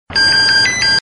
ring tone

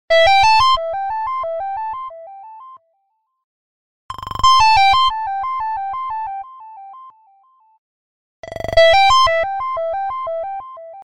samsung galaxy s4 tone ringtone

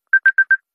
iphone signal ringtone

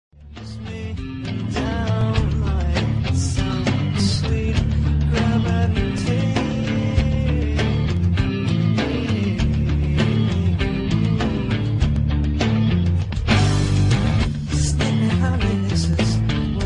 iphone 7 rock ringtone

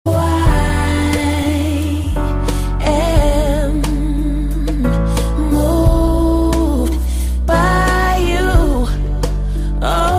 remix ringtone; iphone x

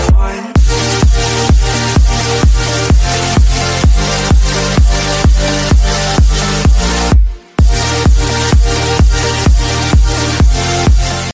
i phone 8 plus ringtone

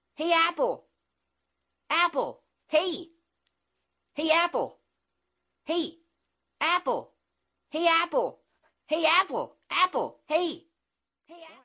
iphone 6 plus electro remix ringtone

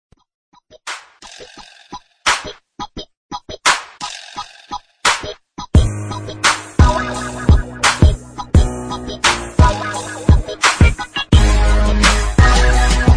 piccalo ringtone; i phone 6

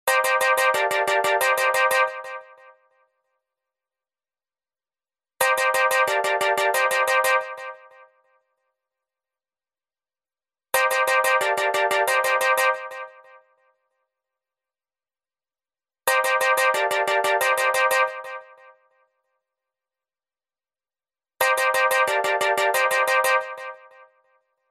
iphone 3g old ringtone

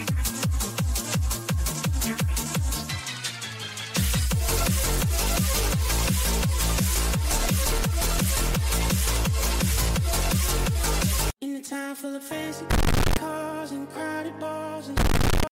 i phone sc ringtone

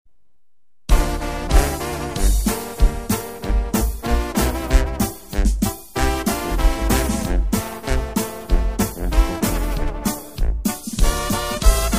espanol remix ringtone; iphone 7 plus

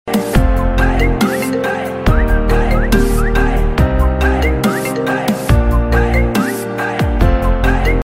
iphone 8 plus notification ringtone; french

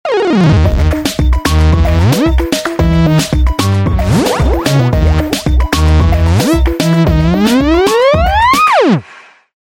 apple iphone 6s plus space tone ringtone

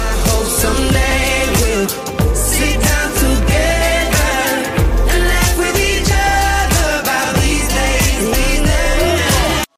iphone 7 plus remix ringtone

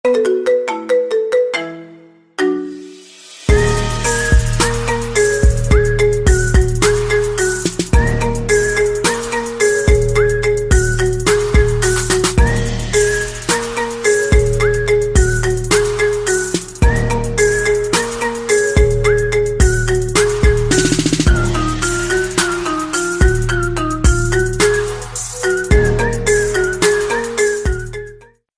remix ringtone beatbox; iphone 2018; iphone 8 plus; hd

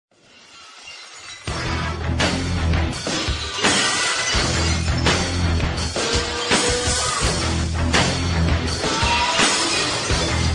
rock ringtone; iphone 7

